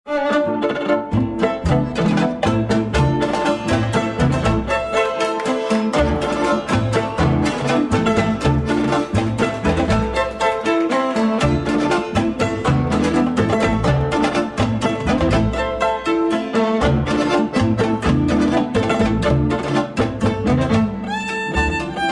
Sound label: violin